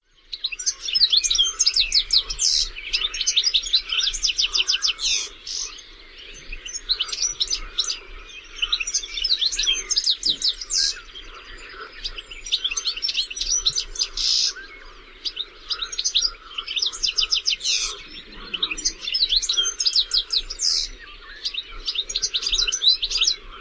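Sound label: goldfinch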